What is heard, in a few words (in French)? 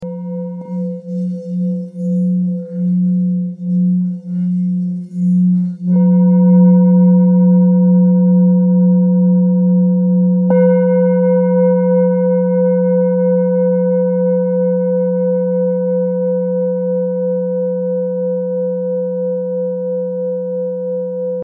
Bol ancien fa diese